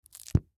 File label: Textbook Close; Book Sound Effects; Offices; Foley